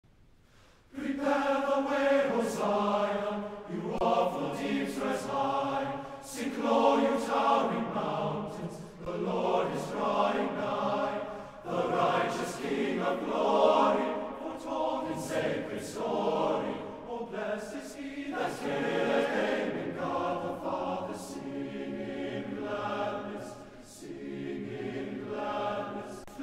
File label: for Male Choir Live music